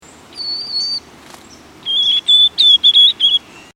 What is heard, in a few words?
White throated Sparrow plastic song; Macaulay Library